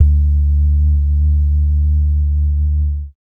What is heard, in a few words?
bass dums; Spectrasonics Vocal Planet; Jazz FX